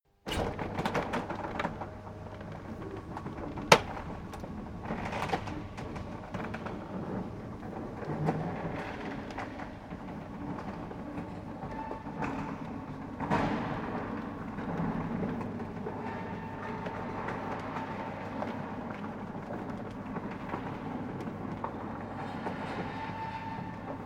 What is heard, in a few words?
Industrial Mechanical Electric Door Large Close Perspective External Open; Metal Door Sound Effects